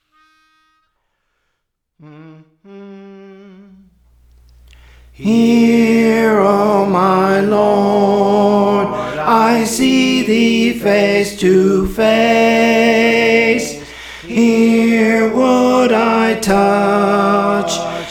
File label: Tenor; Praise For The Lord Training